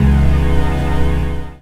Chords Tones; Equipped Music; Loungin House

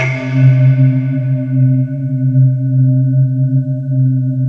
bowww C3

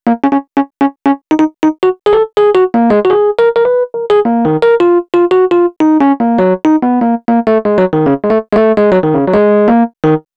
Rhodes Solo, Cinematic Funk

